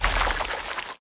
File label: deadly Spikes out; heroes grimson